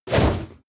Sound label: comic swoosh; swooshes-swishes